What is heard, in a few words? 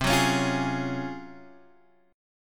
G sharp 13th C